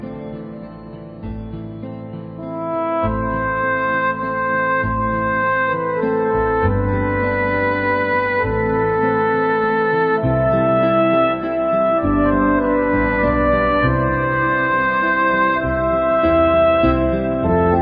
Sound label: Violins